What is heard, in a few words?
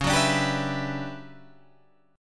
D Major 13th C sharp, chord